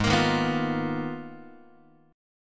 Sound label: G sharp Minor 6th Add 9th, Guitar; G#m6add9 strummed; x,4,1,1,3,4; JGuitar